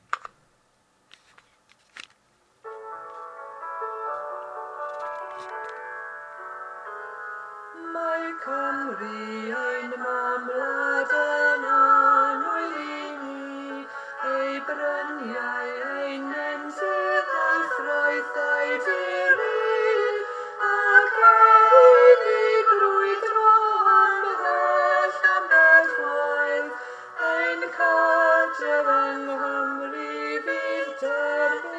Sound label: duet alto verse